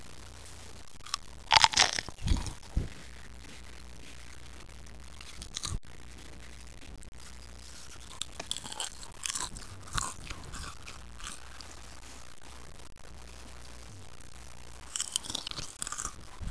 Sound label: eating